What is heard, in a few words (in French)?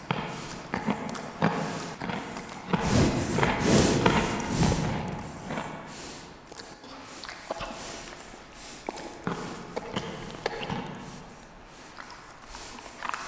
mange; Feed The Beast; Monster